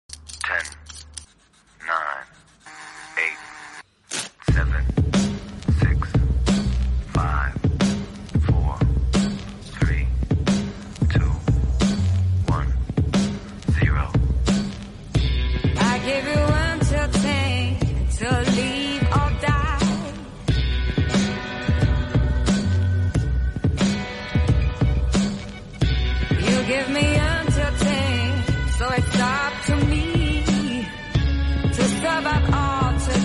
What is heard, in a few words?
music happy birthday tik tok